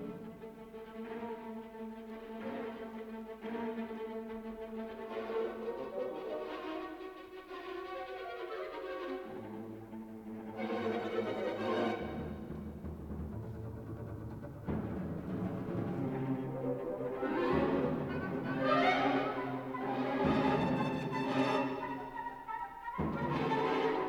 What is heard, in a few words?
music for orchestra